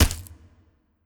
Impact Gore Crunch; Impact Gore3 Crunch3

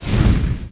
Super Dash Burst

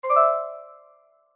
Sfx Golf

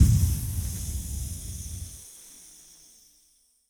Shroom Charm Poof; Hero

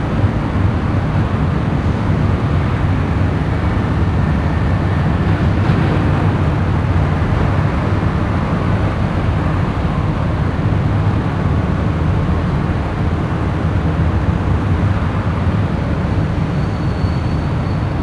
Amb New quack traffic; Fur Fighters

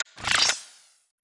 Sfx Golf

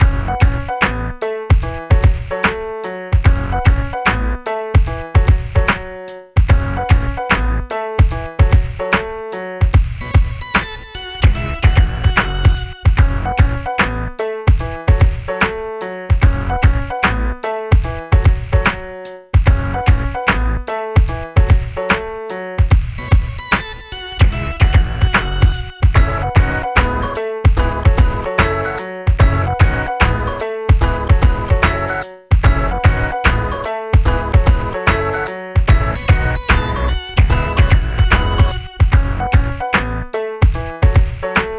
Bpm74 Loo L; Fu-Ten

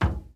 Footstep Metal Hollow

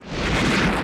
Bats Reform; Grimm

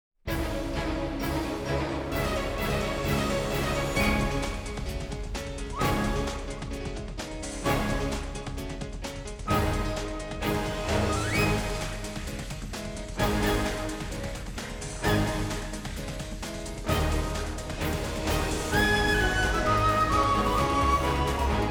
Battle Boss; Type Grb Lord

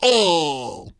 Pirate Barrel; gamerip

